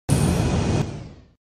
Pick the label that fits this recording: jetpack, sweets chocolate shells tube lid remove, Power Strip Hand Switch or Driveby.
jetpack